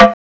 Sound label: COWBELL; CMI Sound Library Rev 1.3